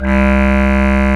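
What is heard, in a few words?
bass clarinet, Orchestral Winds; Roland LCDP04 Orchestral Winds; Lo Clarinets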